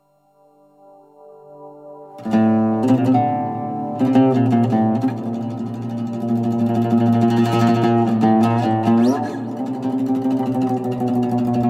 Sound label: Oud Cessation